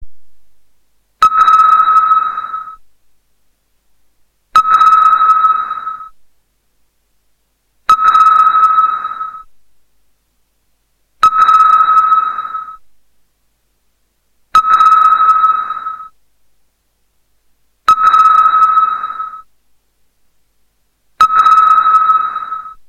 sonar radar

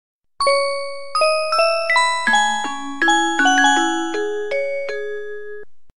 screen touch blackberry

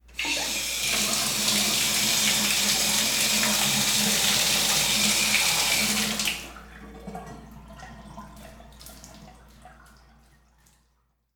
Bathtub Faucet

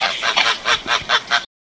chicken hen

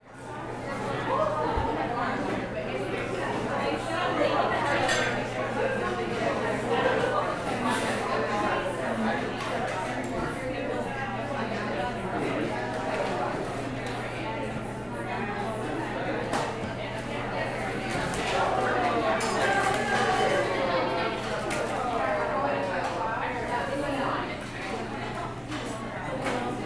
Restaurant

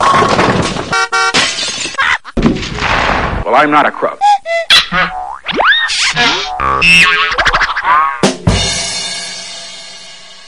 comical radio station sounds

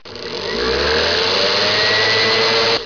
household

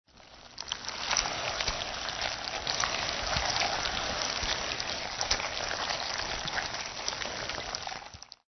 ANIMAL CREATURE

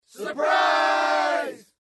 surprise

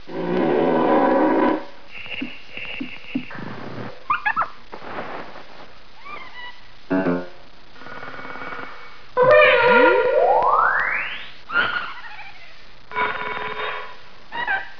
cartoon

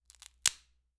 Potato Chip Crack

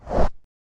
baseball bat swing

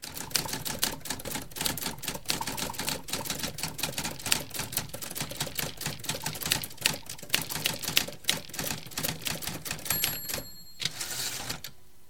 typewriter and bell

